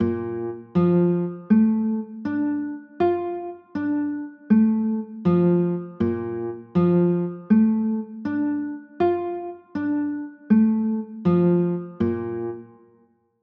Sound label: b flat maj7